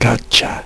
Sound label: yahhah; RChess 2.2.2